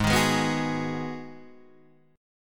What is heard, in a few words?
G sharp Minor 7th, Guitar Half Step Down